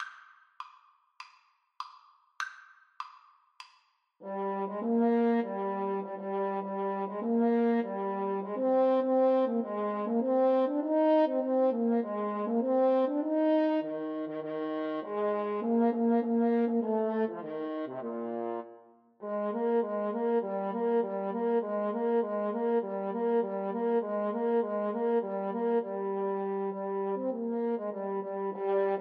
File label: good time HN duet, French Horn Duet